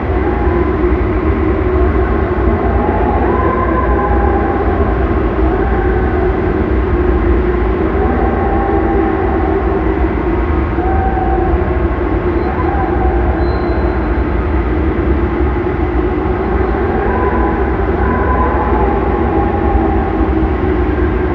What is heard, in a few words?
Chasm Anim Wind Loop